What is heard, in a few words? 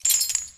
Chain Impact; Puddle; puddle plus ios gamerip 2018